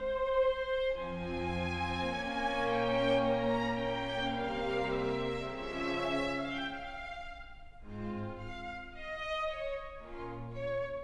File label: classical, music genre